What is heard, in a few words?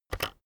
keyboard external enter press very fast